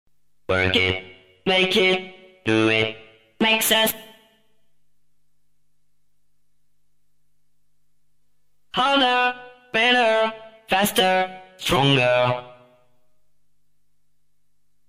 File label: acapella